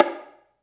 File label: BONGO H.S; AKAI S-Series CD-ROM Sound Library VOL-5